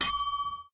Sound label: desk bell ring